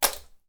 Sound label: slime splat